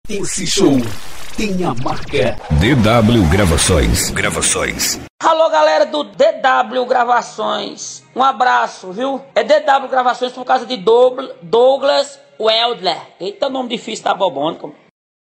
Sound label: Banda Swiinguetto